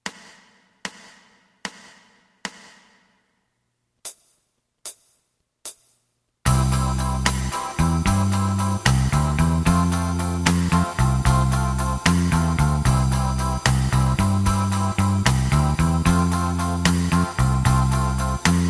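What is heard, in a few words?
lead guitar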